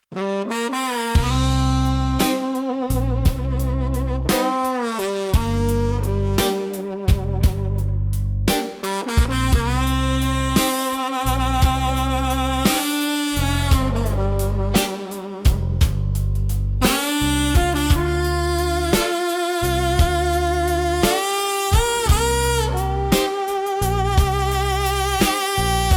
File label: ukrainian beat